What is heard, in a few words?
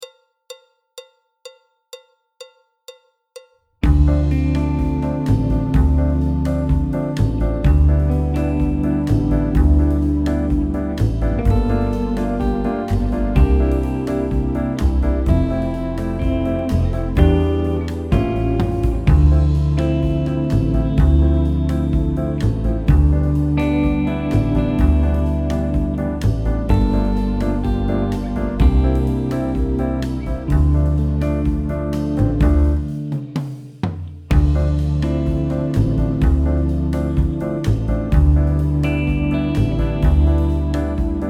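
backing track, Jazz; alto, trombone, piano; Jazz Syllabus Level 1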